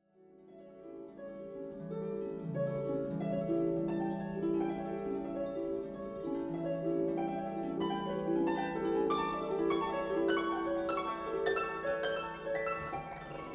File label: Harp; orkest